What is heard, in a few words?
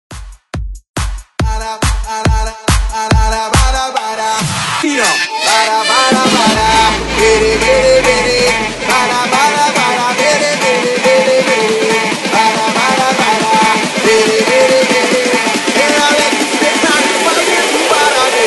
Vina Bounce 140 Bpm; DJDOLLS; Redrum